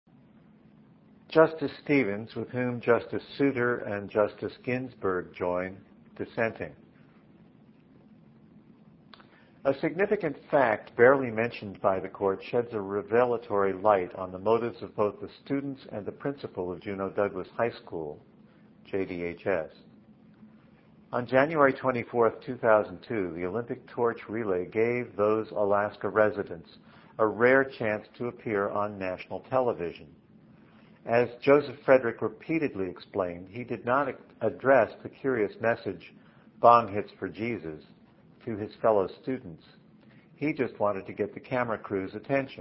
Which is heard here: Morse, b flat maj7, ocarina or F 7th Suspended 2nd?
Morse